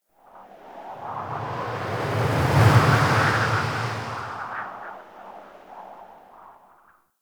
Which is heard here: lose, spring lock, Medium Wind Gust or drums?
Medium Wind Gust